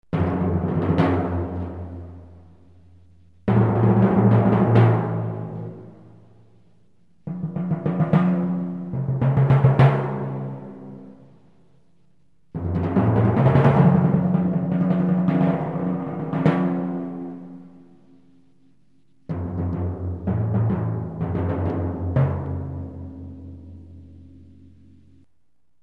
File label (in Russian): litavri; Литаври; Контробас, Ксилофон